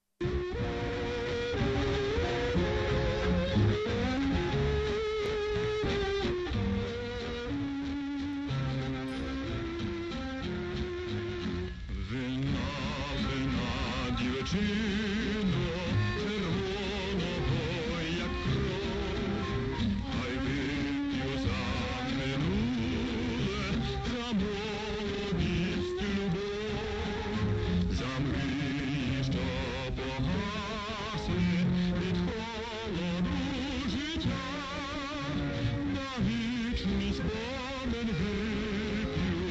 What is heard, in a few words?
Ukrainian tango